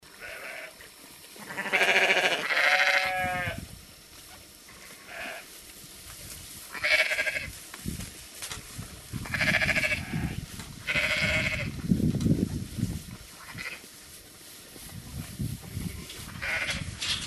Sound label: sheep in pen